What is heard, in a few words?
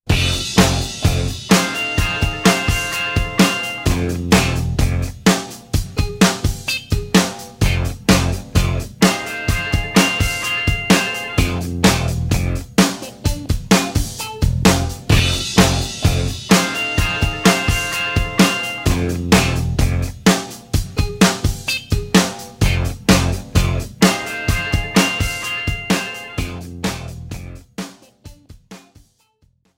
commercial song